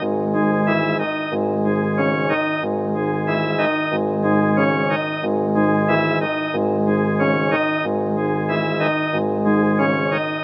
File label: shady hip hop rap music tone